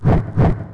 lava toad stand

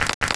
unfrost flower move

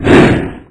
tendrilrion attack

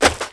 stone shooter attack

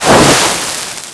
salamander attack

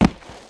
zombie prisoner die

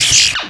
attack; B YORTUS A

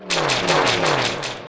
die; LETTERSTER

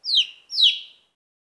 bird

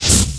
spirit flame attack

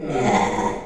shelter move

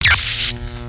stasis

